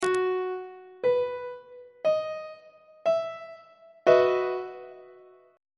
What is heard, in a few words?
d double sharp major 7th suspended 2nd 1st inversion; treble clef